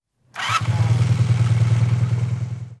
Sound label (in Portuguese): moto ligando